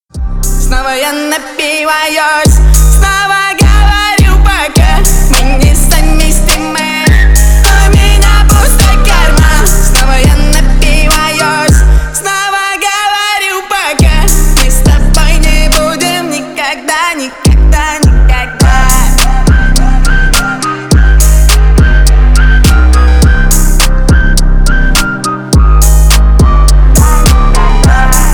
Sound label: bass boosted remix